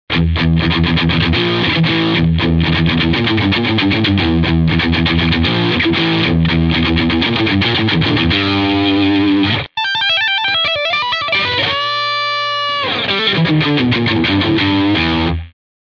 Sonic Disto, pedales

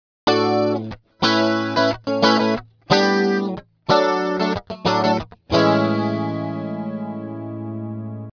Multi Chorus shimmer

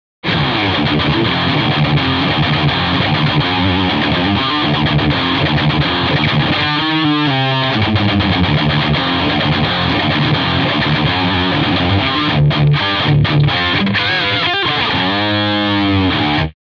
Sonic Disto, pedales

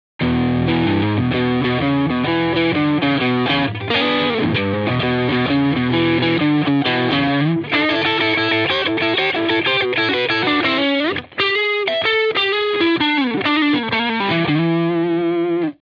OD820 Over Drive Pro, pedales

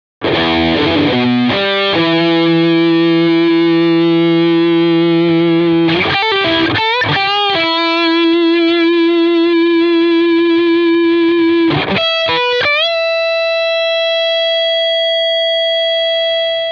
Overdrive

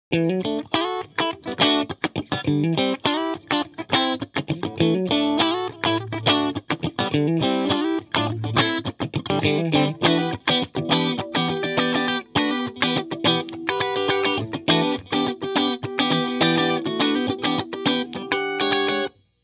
Compressor

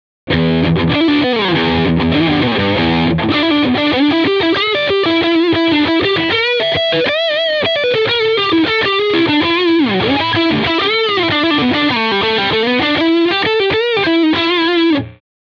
OD820 Over Drive Pro, pedales

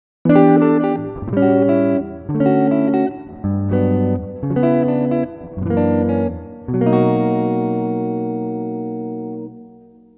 Digiverb smooth hall

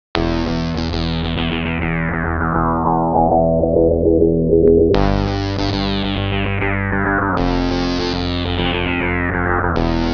Synth Wah slow synth

